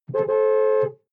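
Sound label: sports car interior horn rhythms; verkehr-transport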